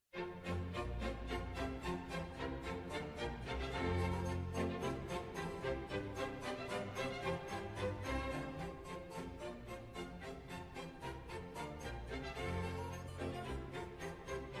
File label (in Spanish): Bourrée Música Acuática; Haendel